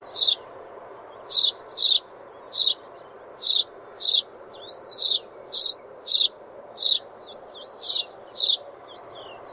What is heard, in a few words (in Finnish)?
pikkuvarpunen